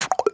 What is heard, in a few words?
FX Drop it; aawaz